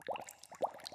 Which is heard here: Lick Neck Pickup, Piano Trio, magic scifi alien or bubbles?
bubbles